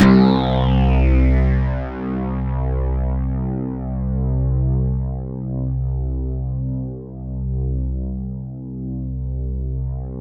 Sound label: C2, Synth Line; 90 s Sample CDs; NiceBeats; SynthLine VOL-27